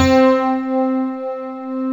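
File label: grand piano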